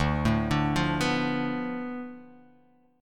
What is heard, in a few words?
D Minor 6th